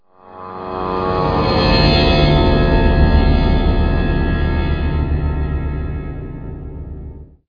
Game Start; CastleMiner Z; Xbox 360

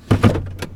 Gear Shifting

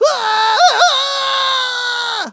Sky Diving, scream; ice age scrats nutty adventure

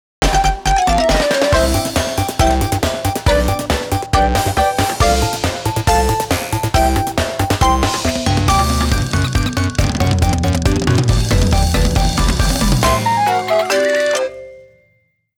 Two Ingredient Failure; paper mario the thousand year door

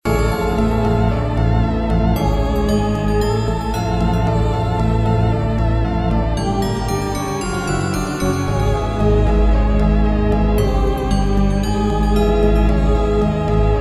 short loops

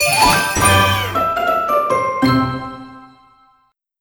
Crunchy Finish

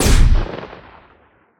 Sci-Fi Weapons-Assault Rifle-Single Shot

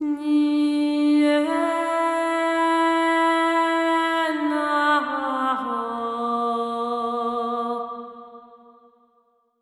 singer, soundtrack; Tyranny Gold Edition

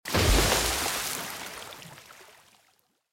glider crash Water

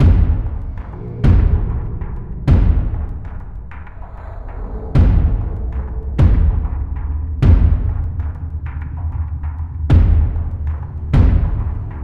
Dramatic Percussion; Fault Milestone One

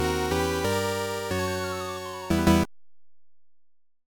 Music - Jingle

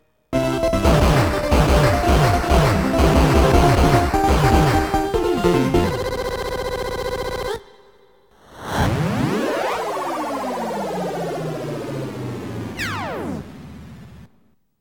Chalenging Stage Perfect ~ Countdown ~ Warp; Dragon Spirit Emotional Sound of Namcot; Galaga '88

